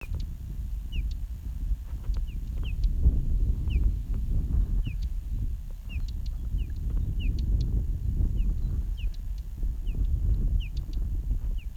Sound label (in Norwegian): lyd av fugl